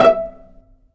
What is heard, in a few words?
STR E4, STRING PIZZ; Best Service ProSamples vol.55 - Retro Sampler; AKAI